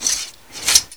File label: Inf Weapons Foley 3rd; Red Orchestra 41-45